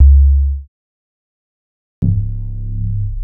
Bass, Futurist Drum & Bass

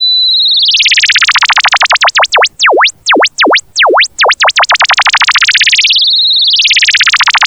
analog, special fx twisted; USB Soundscan; AKAI

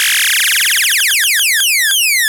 Zapps, sci-fi

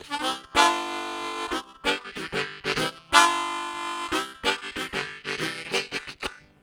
Semi Train Bass, Harmonica; Zero G

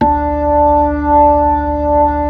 B-3 Club Xfade, Keyboards of The 60's and 70's

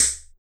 RSS PERC

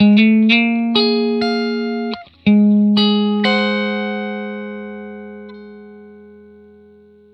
Vintage Blues Guitar; USB Soundscan vol.22; AKAI

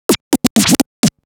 Scratch Fills 140BPM; Vengeance - Essential Clubsounds Vol.4; Scratches

